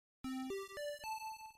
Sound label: Transition Music; Mikeneko Holmes no Kishidou; gb